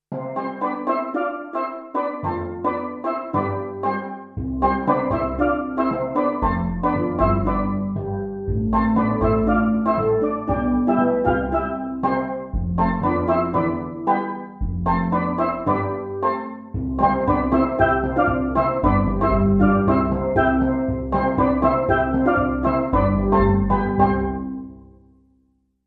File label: Steeldrum